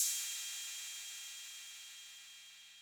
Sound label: Cymbal Max Decay, 808